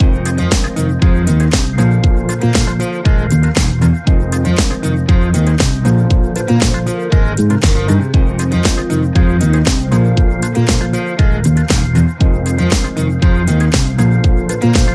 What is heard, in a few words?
Rock